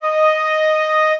flute

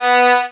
violin

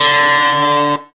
electric guitar

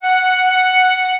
flute

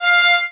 violin

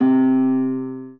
spanish guitar

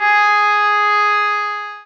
sax

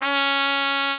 mexican trumpet

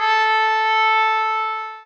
sax

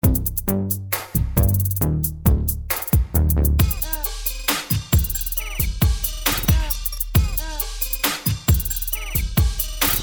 upbeat

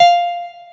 piano

